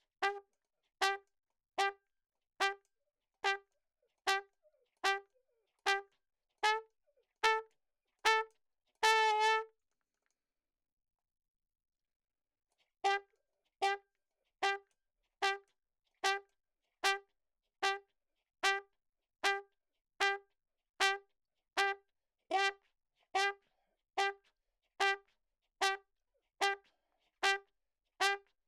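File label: RAGTIME trumpet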